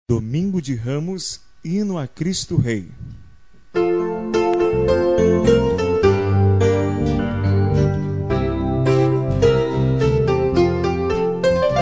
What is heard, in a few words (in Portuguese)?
Gloria e Louvor Hino a Cristo Rei